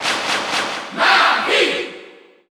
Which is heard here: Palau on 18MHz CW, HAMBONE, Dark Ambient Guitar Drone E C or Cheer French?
Cheer French